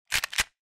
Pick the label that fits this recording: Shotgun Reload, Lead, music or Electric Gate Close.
Shotgun Reload